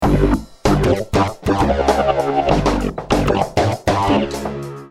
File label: DX7; Murf